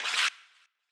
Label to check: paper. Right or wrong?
Right